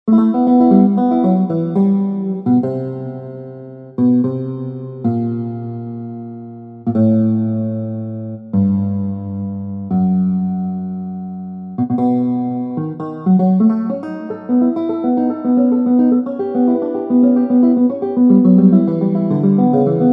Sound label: guitar